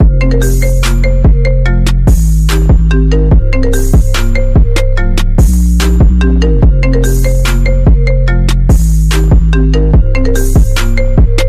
Beat

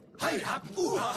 Haha

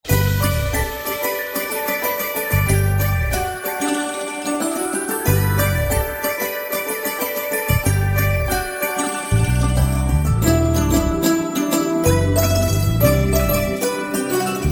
Santoor Rhythm

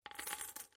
Msg Coins earned

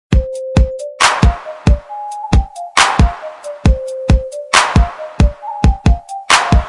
Hip Hop Tone

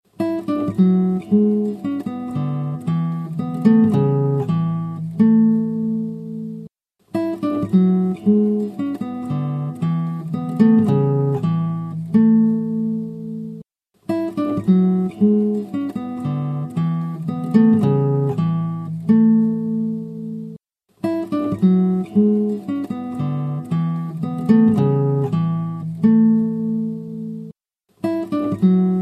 Nokia Tune

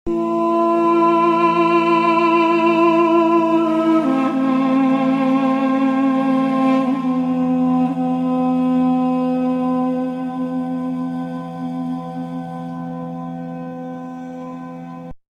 Duduk Intro

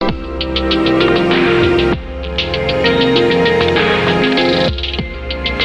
lofi; jjba

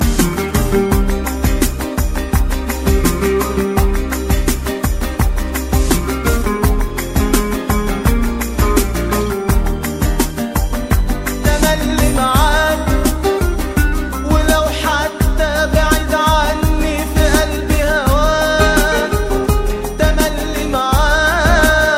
Arabic Song

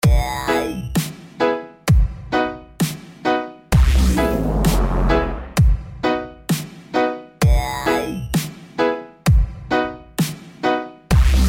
Reggae Dubstep

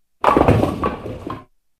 Bowling Strike

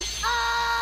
Screaming; Dazai

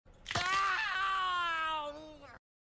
Screaming

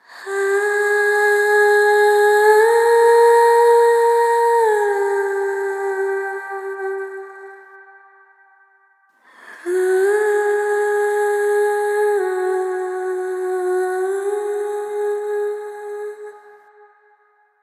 Vocal Swell

